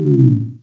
Negative

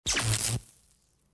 sparks; Soldier Of Fortune II Double Helix; XBOX; ambience

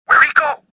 escaping; worker2

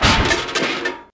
ARM CRSH

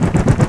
flap, ships engine; Quantum Redshift

